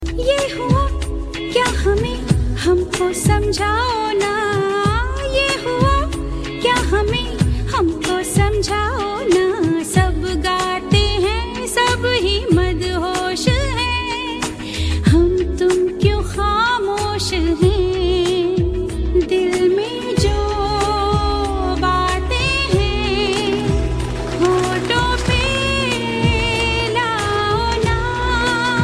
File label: Female Romantic Tune